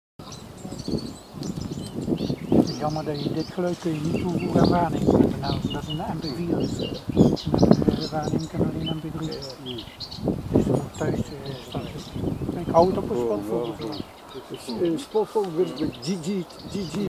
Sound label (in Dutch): Zwartkop; Nederweert-Kwegt